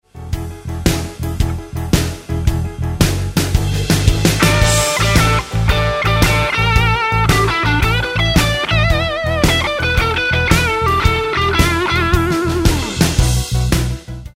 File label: Roadhouse Licks Lick, guitar; 20 Roadhouse Licks